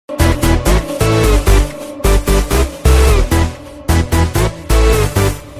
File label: Soft Techno